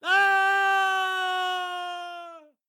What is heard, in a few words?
Voices Generic Death; Adam's Venture Origins